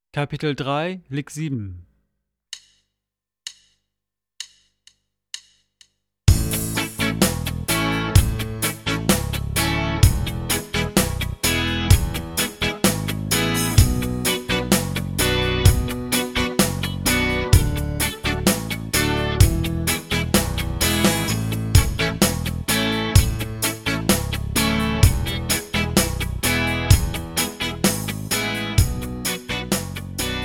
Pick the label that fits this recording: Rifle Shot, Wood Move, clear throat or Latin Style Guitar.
Latin Style Guitar